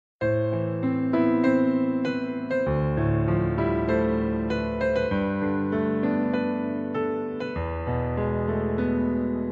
Romantic Piano

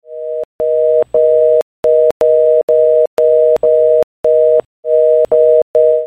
Loud Clock Alert

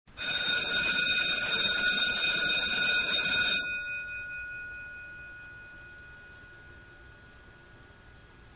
Ships bell

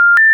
Charging; GT-E1080

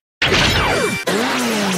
driver open; Decade

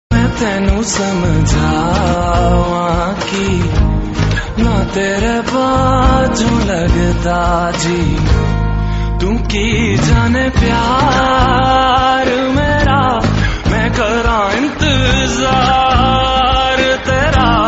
Sufi Rock

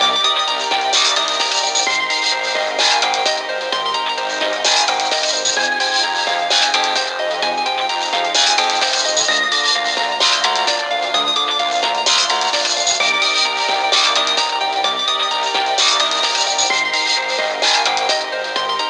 country GBA; Dk3

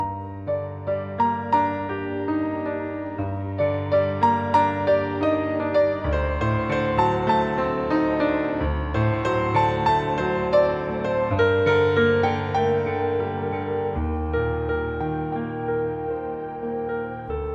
Love Story Piano

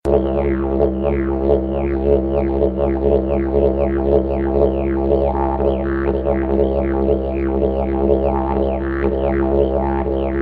Didgeridoo

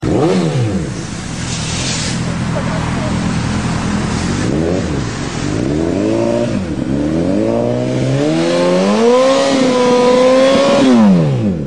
Honda 1000r

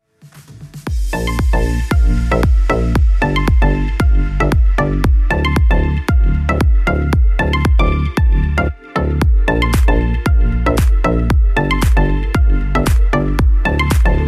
Remix

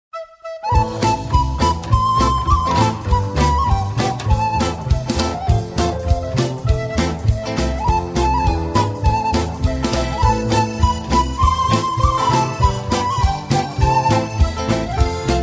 Amazing Flute

